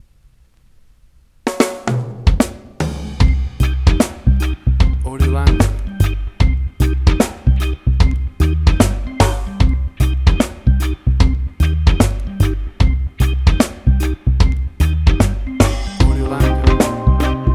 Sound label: Flying on a Groove 75bpm